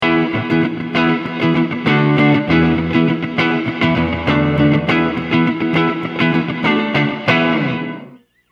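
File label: RV-7 Reverb gated, guitar; Peterson VS-R StroboRack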